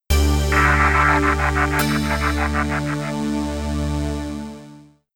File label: Wins; Cyben; FX Fighter; MS-DOS